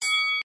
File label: notice